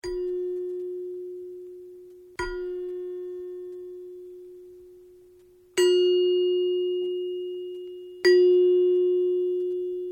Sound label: Chime bar in G, Various strikes; Miscellaneous Musical; Tom Hutchings